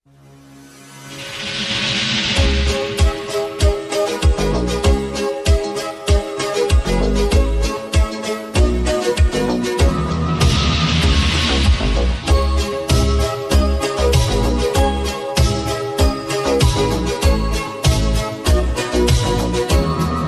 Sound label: Instrumental Song Ringtone